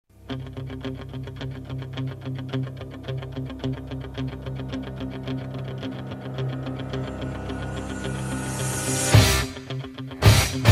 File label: spot music styles